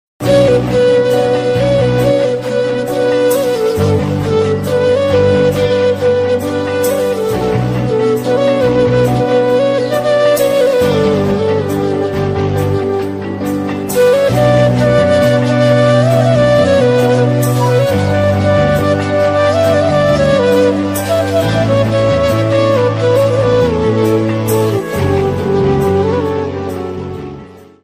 Flute

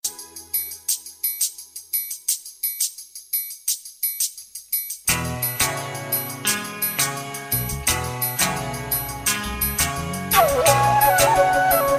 folk bells mix

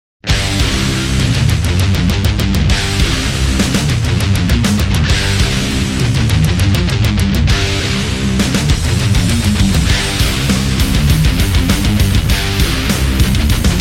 hard guitar

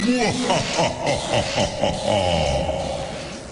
evil laugh; phineas ferb

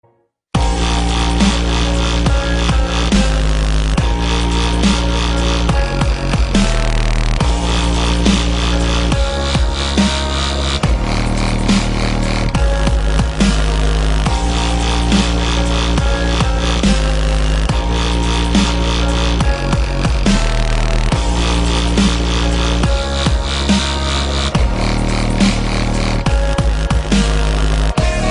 dubstep; resident evil

